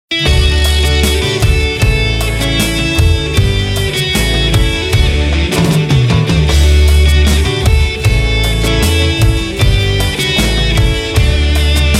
himno pista; medellin colombia

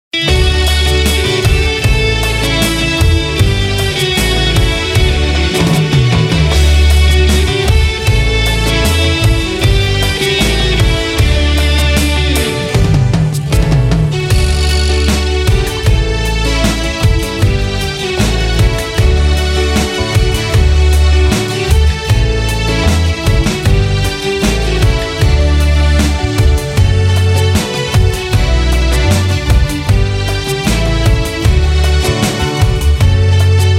himno instrumental; medellin colombia

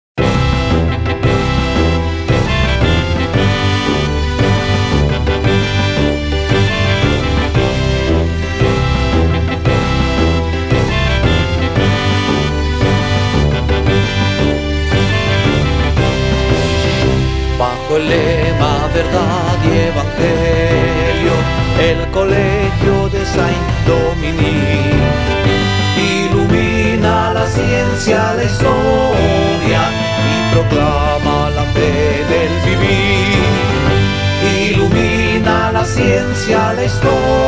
himno school vocal; saint dominic; conocoto ecuador

